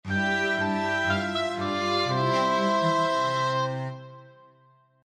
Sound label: Oboe end